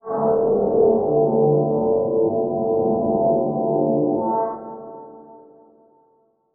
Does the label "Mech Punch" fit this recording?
No